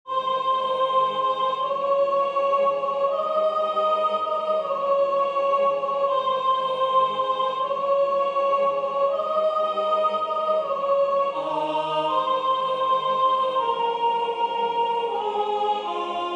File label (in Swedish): SOPRAN; Nu tändas tusen juleljus